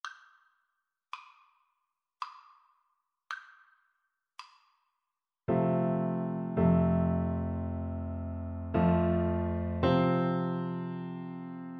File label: amazing grace, Tuba